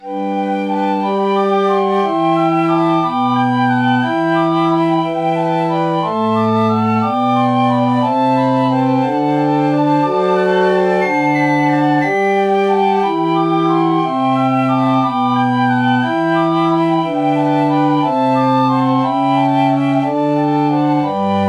piccolo

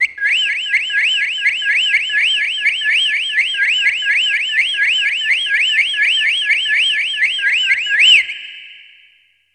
bird tweet bass

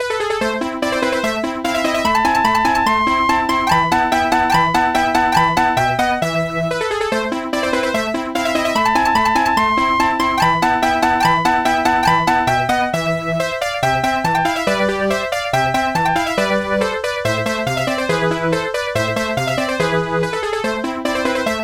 chiffer lead

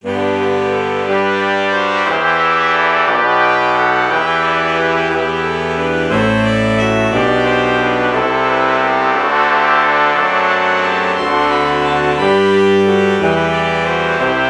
alto saxophone trumpet bach morph

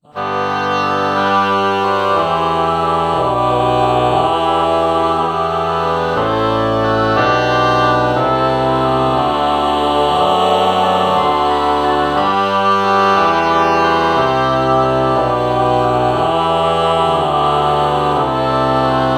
ah oboe morph

